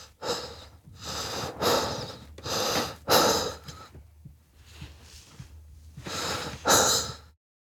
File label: Human Breath